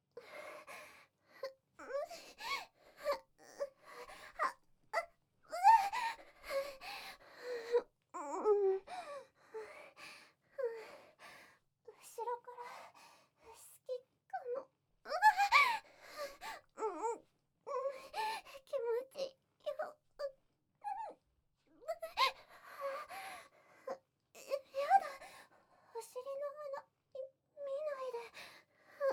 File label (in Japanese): 壁越しに聞こえる喘ぎ声, ASMR; SEあり